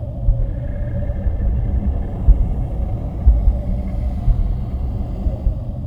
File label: wraith idle; Stellaris; guardians